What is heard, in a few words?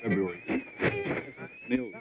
radio; VALUPACK